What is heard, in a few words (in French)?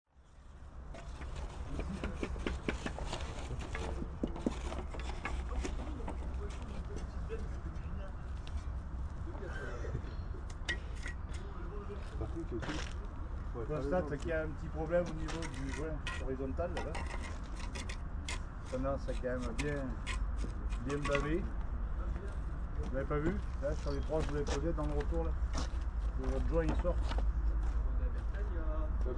Dardilly cours de TP exterieur